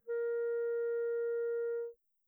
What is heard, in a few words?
normal barrel, clarinet